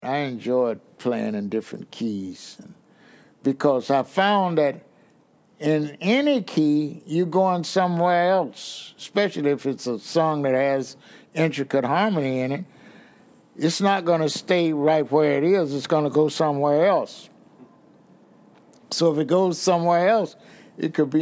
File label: keys